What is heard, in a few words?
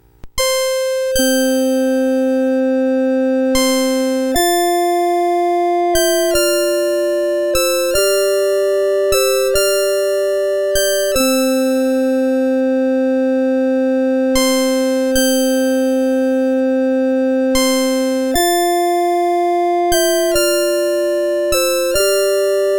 Lullabye, CSG8580R5